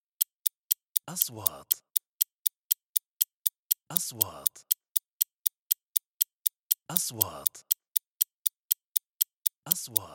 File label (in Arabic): عداد زمني; transitions